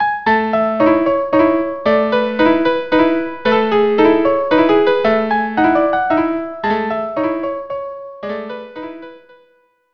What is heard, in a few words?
Spring Dance